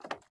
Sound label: fs creakwood, footsteps